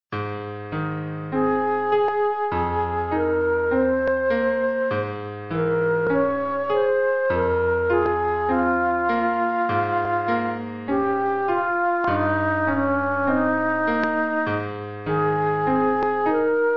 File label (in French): baladin pour flute ut et piano